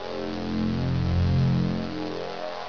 PHASE

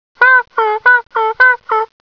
HONK

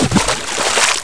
SPLASH; thief

